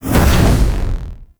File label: Void Dragon Boost; Enemies; Gameplay; Warframe; Duviri